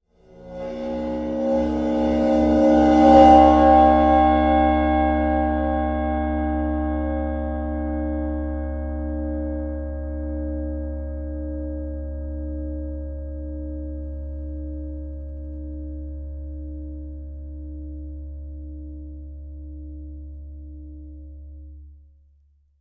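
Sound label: Ride 22 Cymbol Bow close up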